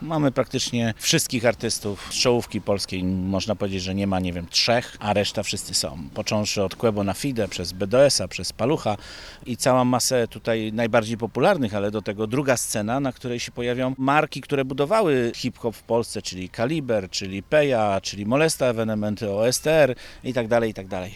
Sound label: rap